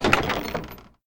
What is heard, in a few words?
chest open